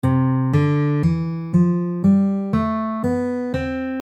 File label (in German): C Ionisch